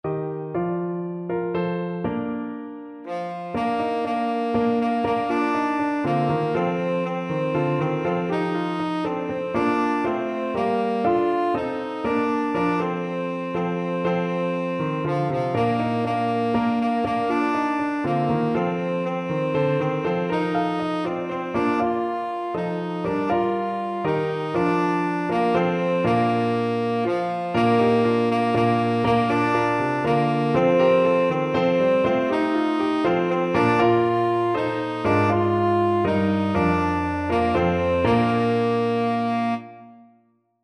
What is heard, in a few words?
tenor saxophone